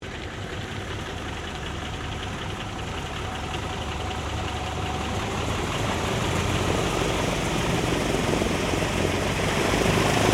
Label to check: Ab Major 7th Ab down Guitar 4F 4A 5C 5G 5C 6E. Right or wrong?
Wrong